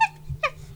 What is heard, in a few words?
monkey; E-MU Producer Series Vol. 3 – Hollywood Sound Effects; Water